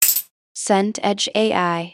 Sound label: tambourine hit